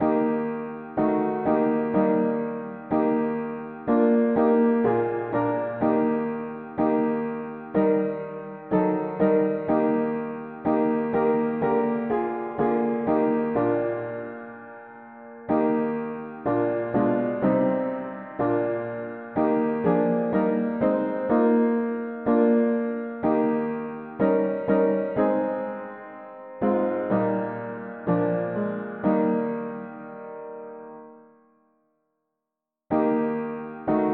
mono piano, hymns

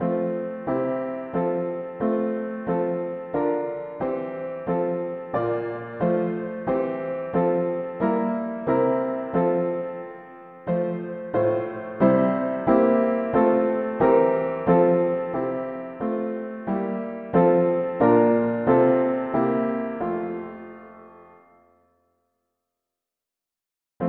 mono piano; hymns

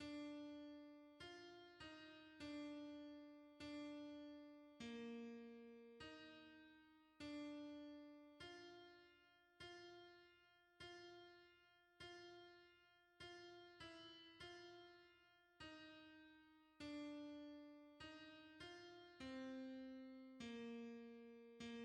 hymns, alto

